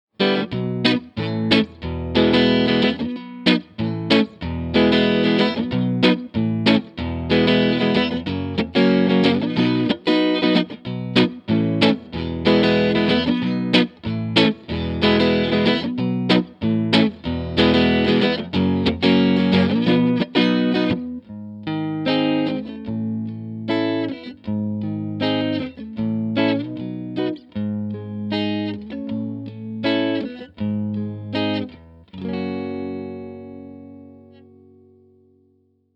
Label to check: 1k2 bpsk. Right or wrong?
Wrong